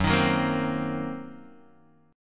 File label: Fsharp 6th Flat 5th down Guitar DADGAD